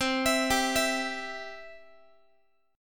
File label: C Major, Guitar; arpeggiated; x,20,19,17,20,x